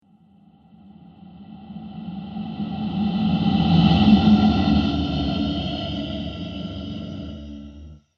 Ghostly Halloween Monster; Ghost Sound Effects

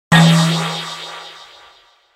Hit Filter Light Flange; Blastwave

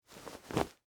Winter Coat Adjust, Clothes, Movement; Foley; Straighten, Arm; Dressing Sound Effects; SoundEffects-BlastWave

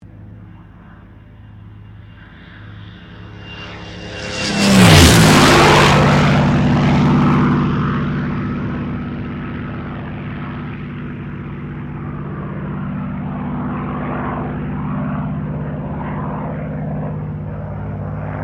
AIRCRAFT SUPERMARINE SPITFIRE MK-XVI PASS

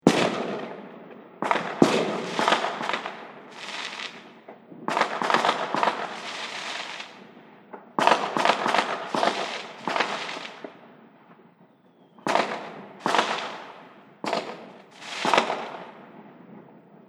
Fireworks Many Explosions; Many Explosions With Small Crowd; Firework Sound Effects

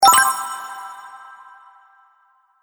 Magic Menu Click

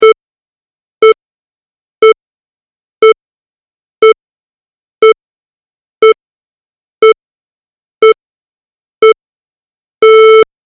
Countdown Beep Tones; Beep Sound Effects

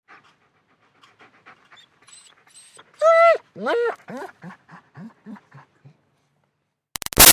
Dog German Shepherd Pants Whines; Dogs; Animals

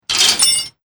Chain Metal Clanging; Metal Sound Effects; LYN103 package of Chain Sounds; Lynne Music

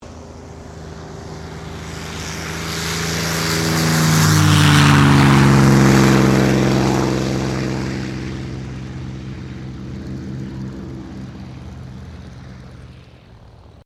AIRCRAFT SUPERMARINE SPITFIRE MK-VIII TAKEOFF; Airplanes (Sound Effects:Vehicles)

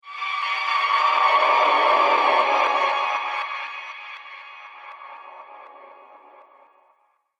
Screams Ghosts; Ghost Sound Effects; horror sound effects

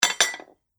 Medium Sized Dinner Plates Stacking Noise; Dishware; Household Sound Effect

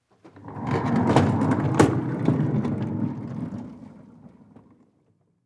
Cart Roll By Cement Long; Cart Roll By Cement Bumps Long; Rolling; Foley; Objects; Blastwave

